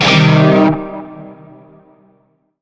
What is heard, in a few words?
Shawzin Pent Min Chord; Warframe; Narmer Shawzin; Chords